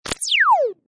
Descend Laser Shot, Scifi; Scifi Production Elements, Set 1; Lynne Music

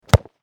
Soccer Ball Kick Punt; Sports

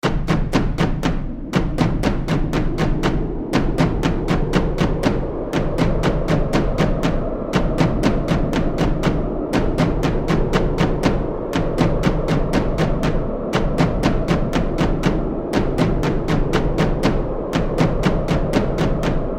War Drums Of Horror, scary soundscape

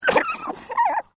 Animal Alpaca Female Grumble; Alpacas on a farm in Gippsland Victoria; Miscellaneous Animal

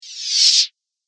Thin Reverse Whoosh; Whoosh Sound Effects

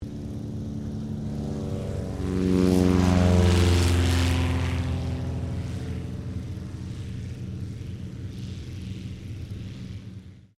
AIRCRAFT CT4A TAKEOFF; CT4 military trainer aircraft; Airplanes

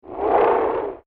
alien creature growl; Aliens; McKinney Sound

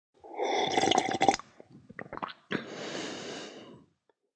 Quick sip followed by a swallow.; Drinking; Sip then Swallow; Human

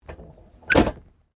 Train Points Manual Operate; Bellarine Tourist railway vehicles; Trains

